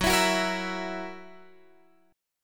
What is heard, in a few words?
Gb 7th Gb down Guitar Open G, chord